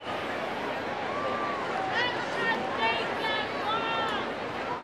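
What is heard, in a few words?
baseball crowd ambience chatter; SFX Kit; Crowds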